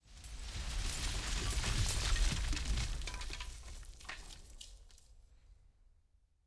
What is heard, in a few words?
entrance, undeadtower